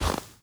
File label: walk snow